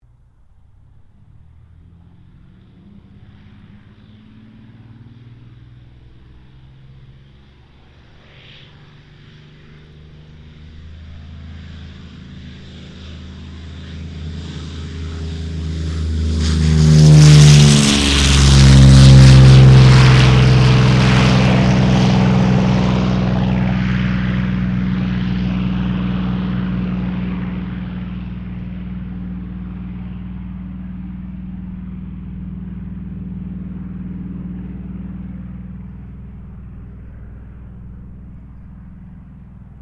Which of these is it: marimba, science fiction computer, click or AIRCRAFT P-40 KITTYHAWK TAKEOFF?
AIRCRAFT P-40 KITTYHAWK TAKEOFF